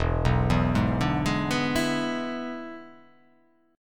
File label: E 7th E down